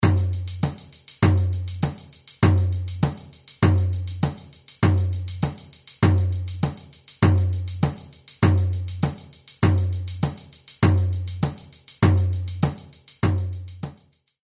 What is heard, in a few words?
shaker sourdo